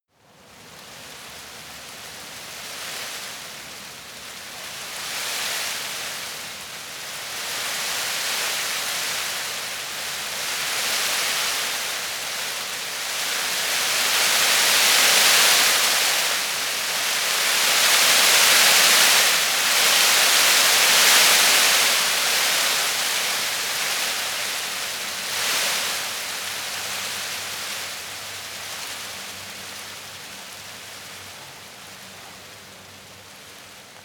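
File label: tree leaves rustle heavy, garten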